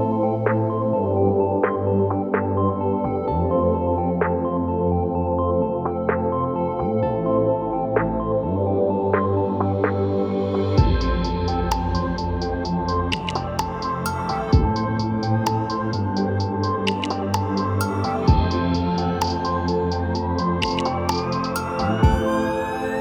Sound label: Instrumental